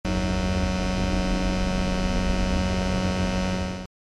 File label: Electric Hum Heavy, buzz